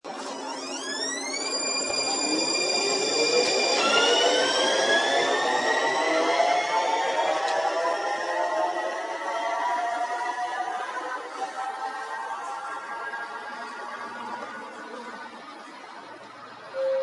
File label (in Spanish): Sonido de tren eléctrico; MadridUnLibroAbierto